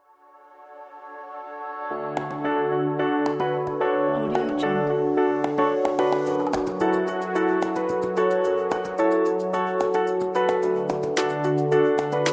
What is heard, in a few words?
light summer background